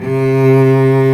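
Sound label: STRINGS SOLO, Chamber Grp; Roland L-CD702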